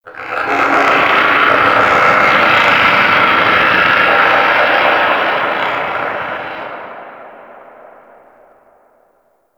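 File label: Shipyards Distant Eerie, Ambience; Warframe; Grineer Shipyards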